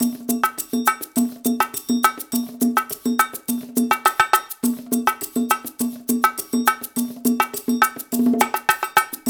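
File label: 108 bpm, conga; Spectrasonics - Supreme Beats - World Dance; Cong-A Go Go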